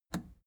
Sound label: plastic button scratch smooth release enable